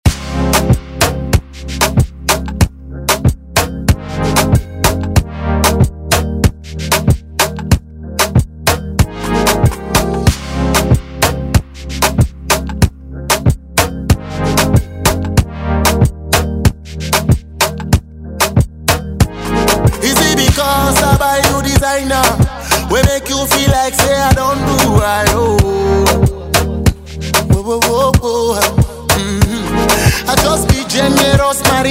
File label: Moombahton Intro Outro Redrum